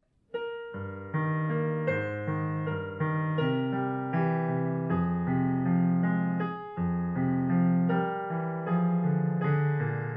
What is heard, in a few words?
Melody in right hand left hand arpeggiate